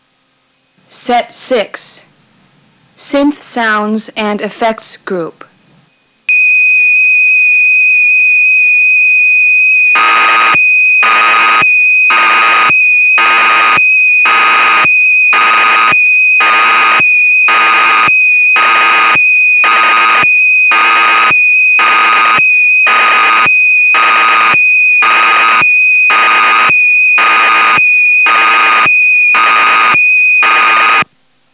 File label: Synth Sounds And Effects